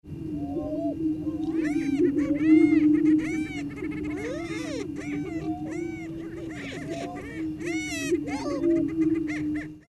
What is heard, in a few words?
CHICKEN GREATER PRAIRE; species/birds; wbba